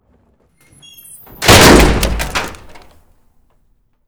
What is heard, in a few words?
door metal lock close; Doors; ModernWarfare